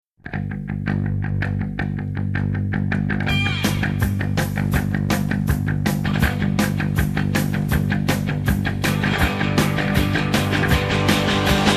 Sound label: beat